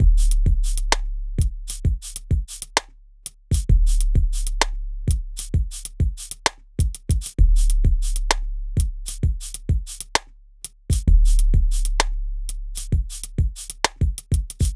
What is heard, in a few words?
Hip-Hop; Strung Along 65